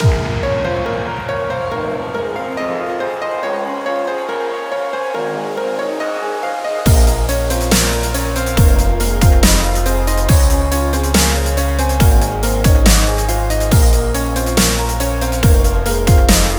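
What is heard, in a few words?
140 BPM Mix, A# minor; Vengeance; Essential Clubsounds Vol.5; Demo Constr Kits; Catch a Plane Kit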